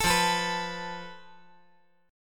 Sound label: F Major Flat 5th